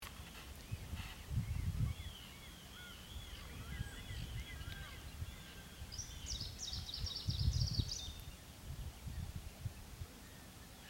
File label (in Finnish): idänuunilintu; haminakari